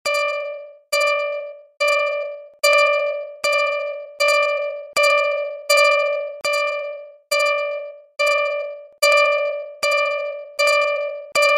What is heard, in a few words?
HTC One Alarm